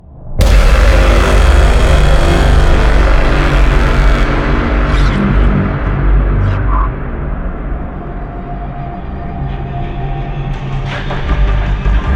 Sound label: Match Lost; Korps; Modern Combat Versus